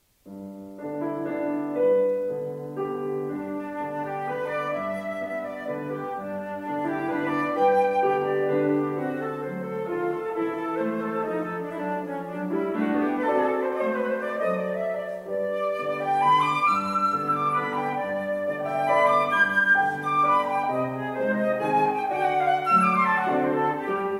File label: allegro moderato; duprato; 6 short lyric pieces for flute and piano